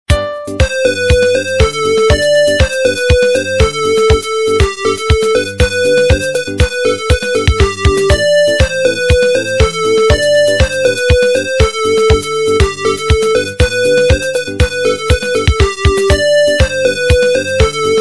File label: theme ringtone